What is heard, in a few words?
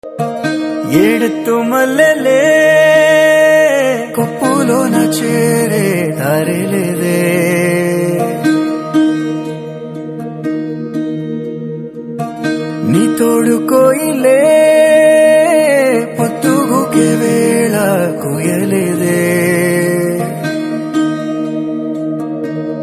Telugu Ringtone